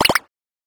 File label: drag with a mouse